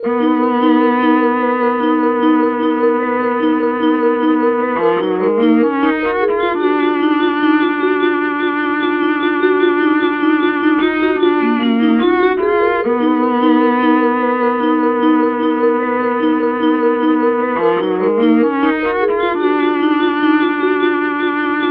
Vibes Violin Combo, Cinematic Funk; Sonic Foundry (Sony Creative Software); Cinematic Funk Urban Tone Poems

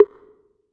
Synth Perc

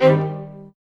orch sting; musicradar; cartoon caper

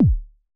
kick, Roland MC-909

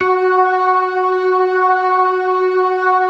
ORGAN 4 DRAWBARS; Keith Emerson Signature Series

